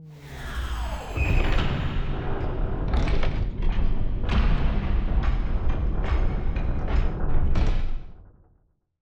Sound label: ship colossus close; Stellaris; shroud; ships